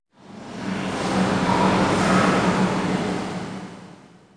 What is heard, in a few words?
PIPES, pipes; zones